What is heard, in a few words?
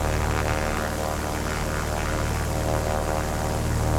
Propeller Engine; Vehicles; Standard Assets